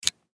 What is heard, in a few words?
Pocket Knife Open; Knives; Combat; foley; Blastwave